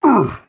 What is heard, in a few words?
Sasquatch hit